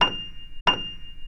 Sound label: F7 forte; pianosound